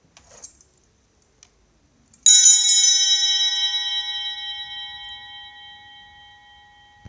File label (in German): Altarschelle Gußgriff